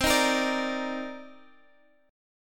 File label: C Minor 9th C Guitar Half Step Down; Cm9